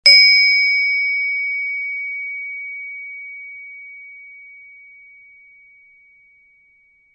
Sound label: sky slice; merkur